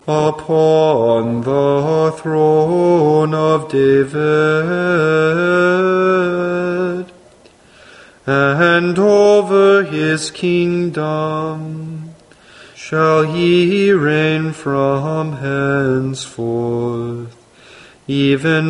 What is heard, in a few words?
Benedictus antiphon